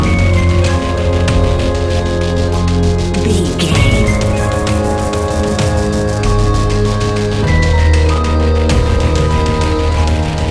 modern horror; Video Game

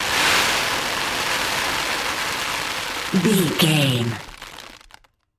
dirt rice pouring gravel debris

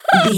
goblin fairy effort jump action

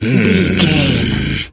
voice male growl

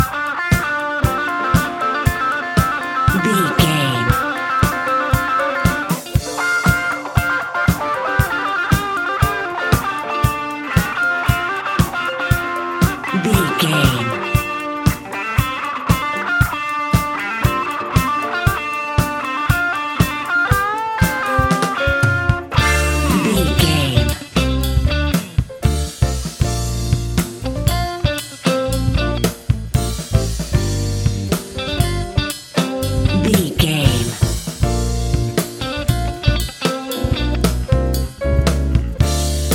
A fast paced piece of electronic dance music

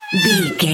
voice fun mouse

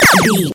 retro laser gun shoot

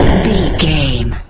retro explosion deep

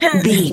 voice female effort quick action

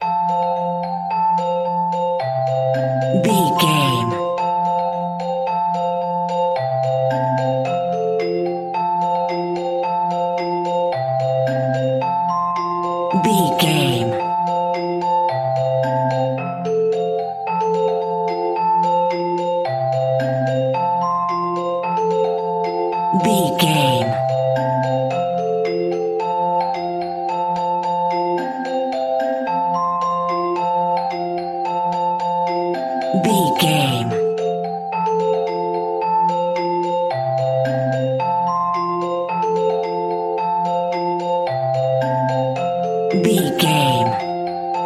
celeste; Mary Had A Little Lamb French Celeste

